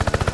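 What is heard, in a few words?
heli blade; SHELL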